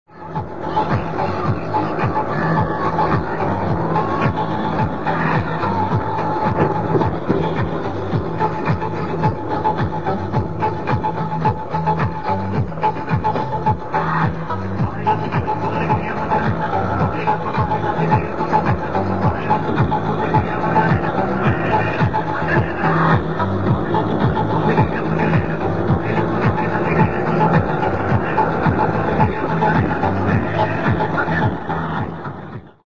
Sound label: rickshaw; india; Hindi-pop and house; coming out of the big speakers behind the seat